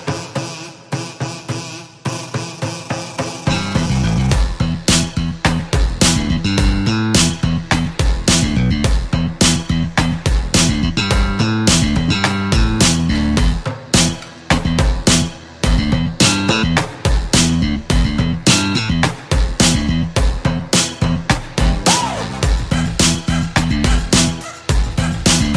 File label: crab vibe